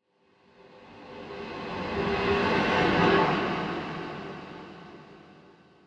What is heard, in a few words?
echoes; thief 2014 gamerip